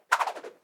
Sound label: Whipy Woosh Transition